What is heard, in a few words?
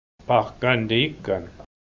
drumstick; pahkantikan